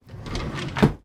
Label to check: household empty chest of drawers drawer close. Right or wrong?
Right